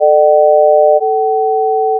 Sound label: amp Mod